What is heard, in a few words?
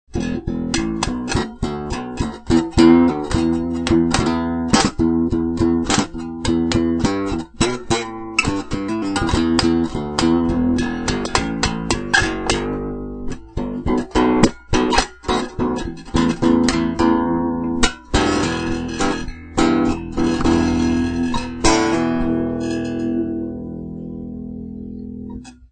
basslab black fing mic; unplugged